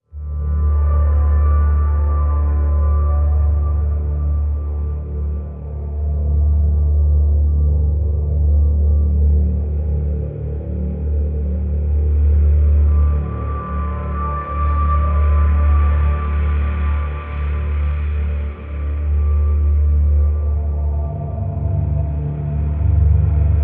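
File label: Drone D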